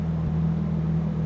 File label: idle, vehicles; TDMCarpack; TDMCars